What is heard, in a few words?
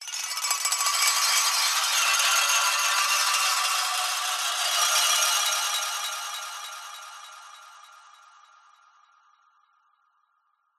sound design tinkle cascade swirl swell multiple magic mystory; skyclad sound